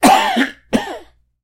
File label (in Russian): blizkaya perspektiva chelovek kashlyaet odin raz